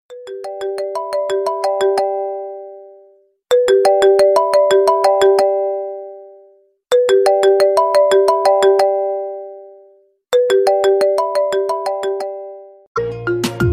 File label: Ringtone